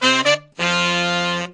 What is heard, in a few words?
cheesy horn section riff; Horns and Brass